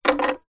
musical violin bow set down on violin

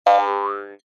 cartoon bounce spring jaw harp

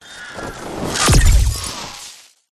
whoosh; Audio Mangler

